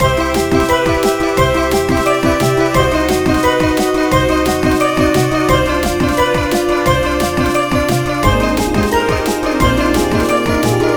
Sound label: Bgm bonus time night